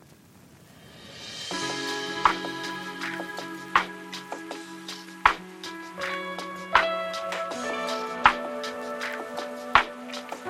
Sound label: Cosmic Chillout Lo-fi